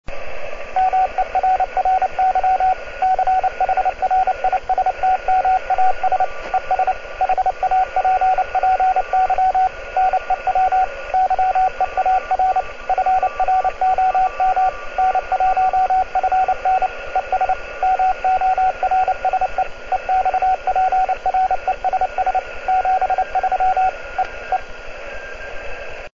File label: morse